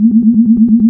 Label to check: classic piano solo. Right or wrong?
Wrong